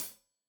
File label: Hat Tight, Hi-Hat, Cymbals; Deeply Sampled Drums